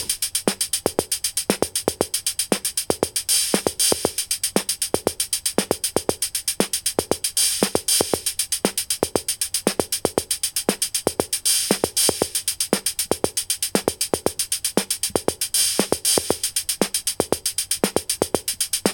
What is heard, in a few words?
Beat Casiotone 405